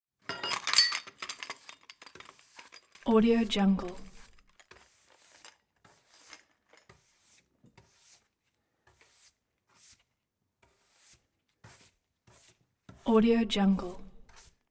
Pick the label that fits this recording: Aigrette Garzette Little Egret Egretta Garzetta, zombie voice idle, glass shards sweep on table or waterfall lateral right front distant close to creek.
glass shards sweep on table